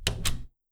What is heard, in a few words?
DoorClose; door close sound effect